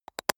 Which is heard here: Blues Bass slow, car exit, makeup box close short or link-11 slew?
makeup box close short